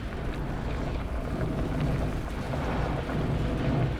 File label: hive minion idle; Stellaris; guardians